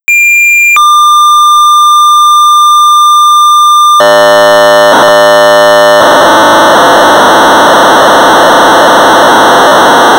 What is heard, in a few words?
BASIC Sorcerer 1200baud; Nuclear Reaction; Creative Computing